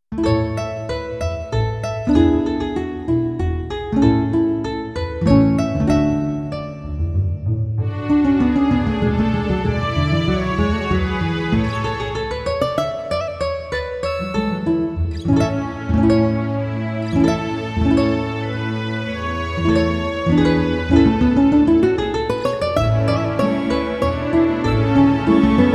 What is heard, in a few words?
Nylon Guitar; SD-90 MIDI